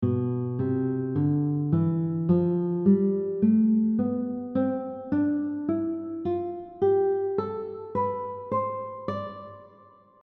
C Major scale shape 4, guitar; C Major scale shape 4 chart

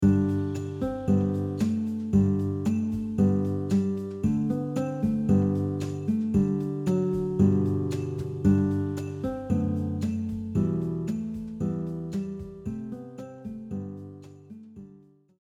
guitar; Deck the Hall; christmas songs

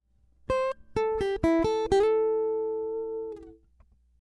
guitar slides phrase; slides on guitar

